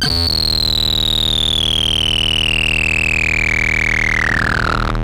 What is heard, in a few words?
Raw Glitch